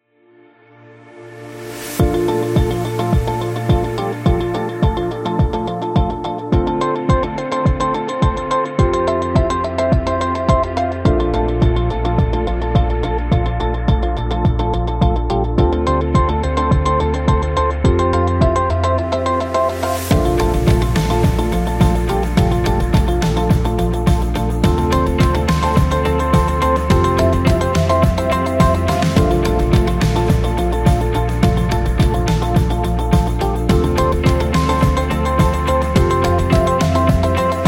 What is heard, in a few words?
Ambient Positive